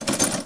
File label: Pickup Armour; SFX Kit; Pickups